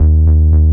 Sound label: BS D#, Hip-Hop & R&B; USB Soundscan vol.47; AKAI